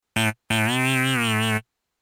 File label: disappointment jingle